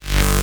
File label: Bass Shot G